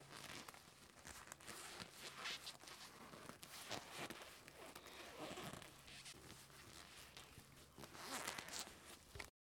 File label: Wearing Boxing Gloves